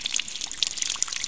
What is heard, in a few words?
stream trickle cave